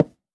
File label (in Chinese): 樱花木 破坏声